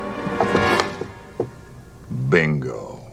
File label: bingo dramatic